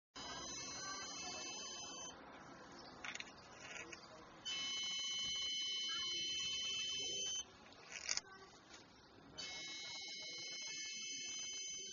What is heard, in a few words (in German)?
ton; Kühlmittelpumpen-Nachlauf; hochfrequenten Ton; Sound- und Geräuschdatenbank; nige Zeit die Pumpe für das Kühlmittel nach. Das erzeugt einen hochfrequenten Ton.